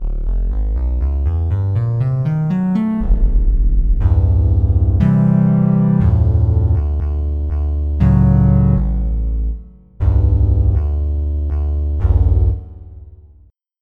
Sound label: Moog; Herb's Moog